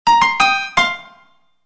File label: Alert